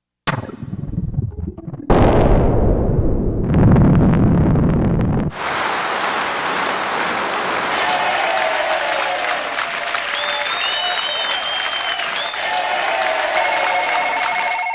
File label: SFX, Factory Rhythm Sets; Roland SC-880